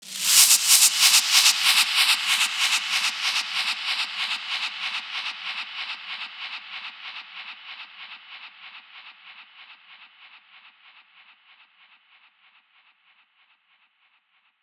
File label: Voodoo 142 BPM, Ethno, Psytrance; Ethno Box; Voodoo Gris Gris